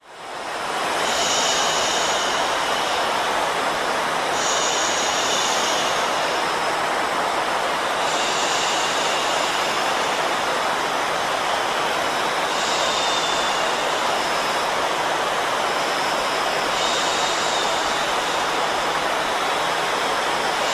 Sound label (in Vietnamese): White noise may say toc